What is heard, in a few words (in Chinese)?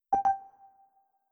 录制开始音效